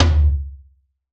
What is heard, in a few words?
TR-707 Tom 6, Roland BK-5 Toms